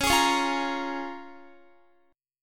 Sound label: Db 6th Db, down, Guitar; strummed